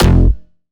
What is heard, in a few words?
Bass F, Bass Synth